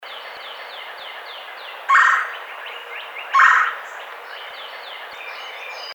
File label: Red-bellied Woodpecker